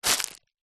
office document folder wallet plastic grab pick up